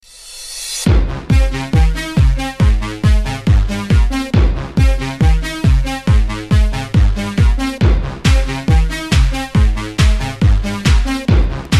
Techno Dreams

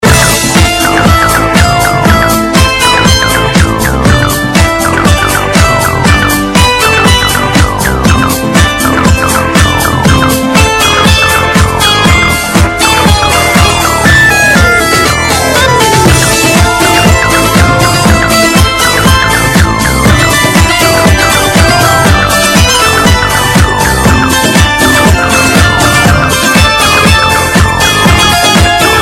Best Guitar

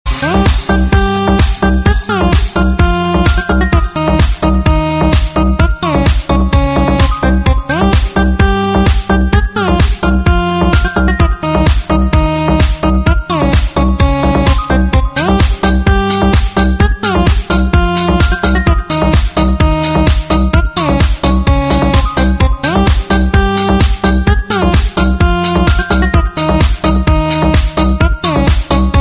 New Techno

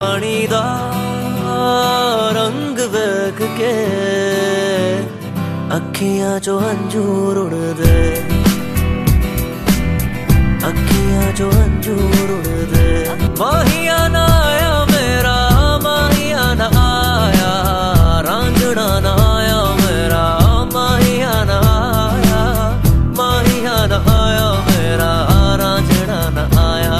Male

